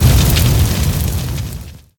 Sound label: sparks